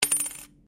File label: Coin Penny Single Drop Laminate; Common coins; Money; Objects